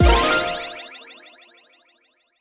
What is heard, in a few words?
3 reel synced; Hot Spin Deluxe